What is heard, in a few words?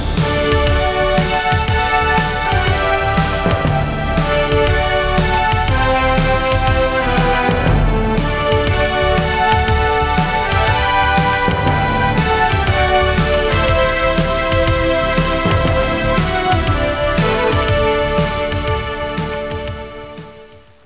Epcot Fountain Music; recordings from Disney theme parks and movies